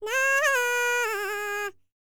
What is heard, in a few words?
BULGARIAN, World Voices, BALKAN